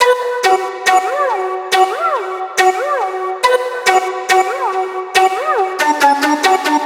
Flute 140BPM Bmin; Offensive; 99Sounds x Monosounds; Instrument Loops

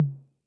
Tom Mid Accent Mid Decay, 808

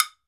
TOKE BEL, Drums Percussion, Old World Instruments; E-MU Producer Series Vol. 7 – Old World Instruments

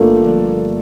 Acoustic Piano

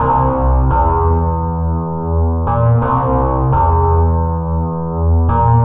bass (spooky); Sonic Foundry (Sony Creative Software); Ugly Remnants VOL-2; Knutrix Demo

